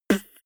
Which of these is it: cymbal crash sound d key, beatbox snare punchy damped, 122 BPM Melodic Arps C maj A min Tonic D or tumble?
beatbox snare punchy damped